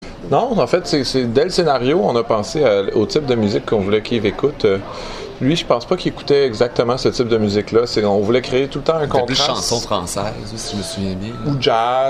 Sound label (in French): musique